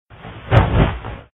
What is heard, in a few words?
Open Box